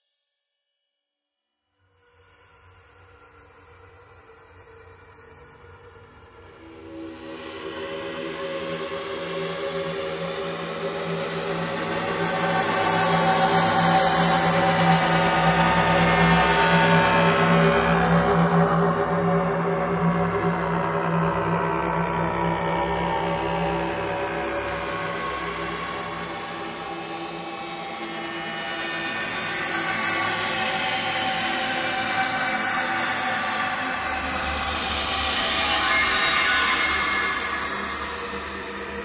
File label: Drones Distorted; Gothic Storm Music; Advanced Sound Design 2012